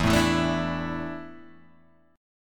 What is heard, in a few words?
A Suspended 4th E